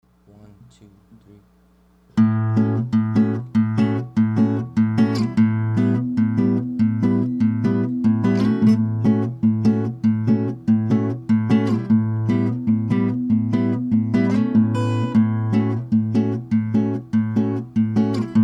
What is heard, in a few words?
interstellar cowboy instrumental